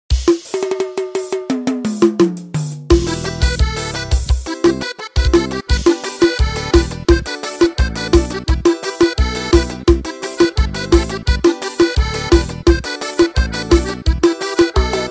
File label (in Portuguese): forro passagem de som